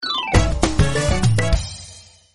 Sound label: jingle intro